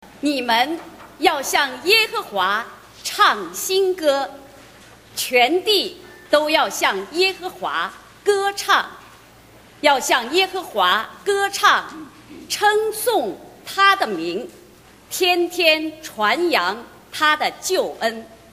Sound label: anthem, choir; 2012 easter canaan hymns mass; recitation